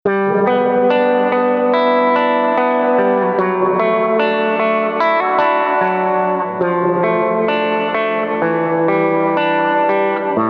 Gretsch Power Jet Line6 M13 Tron Up Cave Roland JC120